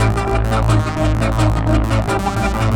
seq, drum n bass keys pads; Sonic Foundry (Sony Creative Software); Knutrix Drum & Bass; Keys Pads 2

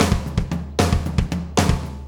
Tom Tom Beat Fill

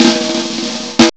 ROLL, DRUMS; M8; CMI Factory

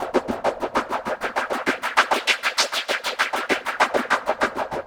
Echo Chuff Splat, Synthetic Pulses

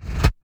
BD, Dance Mega Drums; Best Service

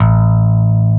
BASS A1; E-MU Formula 4000 Series Vol. 5; Protozoa; Proteus1 Instrmt

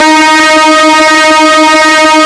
HEAVY D#3, LEAD SYNTH; Best Service Alpha Dance vol.2

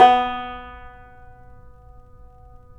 TAMB; Roland - LA Composer vol. 3

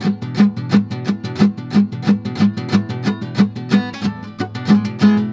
GUITAR, Balkanish Traditions

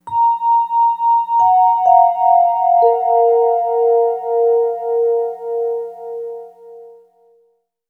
Vibe, Vibes; Sonic Foundry (Sony Creative Software); James Johnson Spektral Minimalism; Sublime Keys

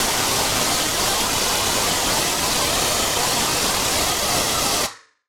Casio, Glitched, malfunctioning synth

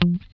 GUI F3, E-Gui Muted; Sound & Vision; Gigapack I CD 2

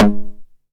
909 SD Tape Sat

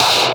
FUZZ CLAP, Drums; Distored Reality 1&2

